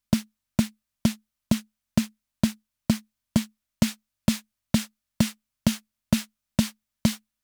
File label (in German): Snare ohne dann mit AC